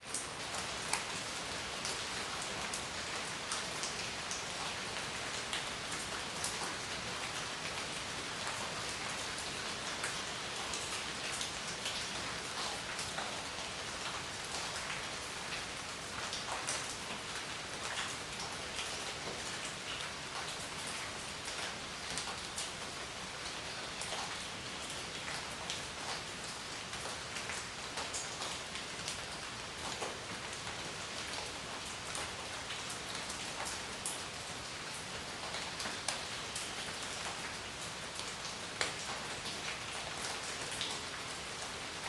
rain cave entrance waterdrops stereo; fvtt sonniss gdc audio

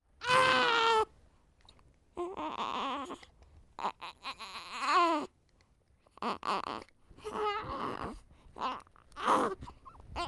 baby newborn vocalizations unhappy; fvtt sonniss gdc audio

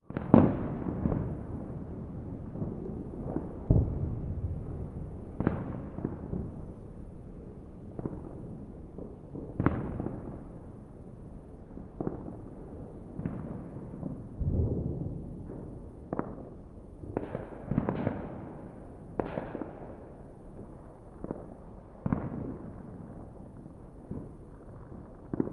ambience war city; sonniss gdc

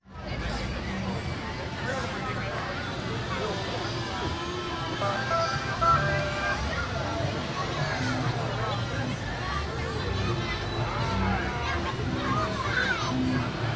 outdoor amusement park; fvtt sonniss gdc audio

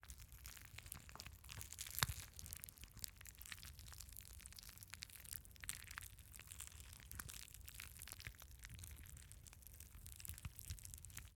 organic gore russle; Sonniss GDC

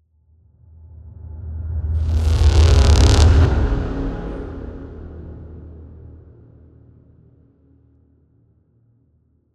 cinematic transition

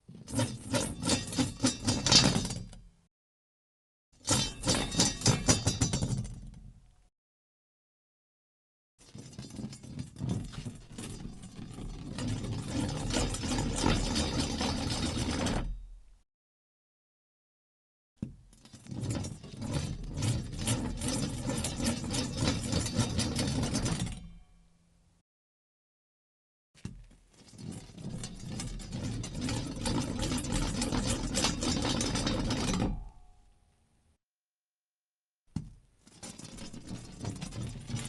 metal spin hub cap rattle many; Sonniss GDC

